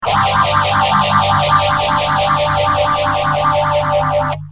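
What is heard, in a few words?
guitar boss ph1r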